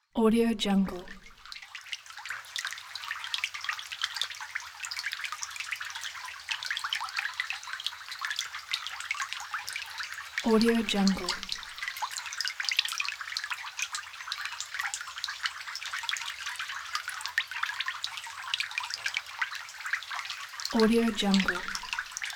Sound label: little forest creek; capeaudio